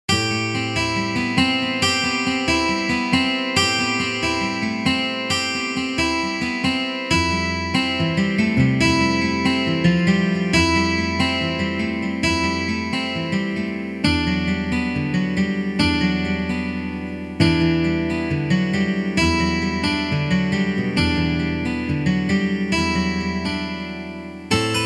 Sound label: Yamaha SPX90 Pitch A Guitar